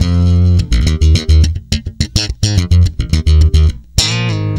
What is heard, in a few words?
F, tweaky, bass; Spectrasonics; Bass Legends